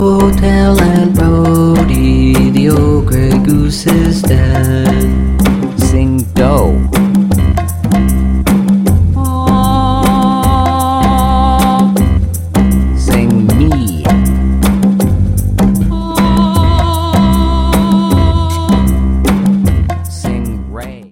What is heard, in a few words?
Aunt Rhody Sing Solfege; Ear Training For Children and Others Young at Heart